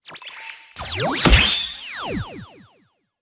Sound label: UI Character Growth Limit Break New; blue archive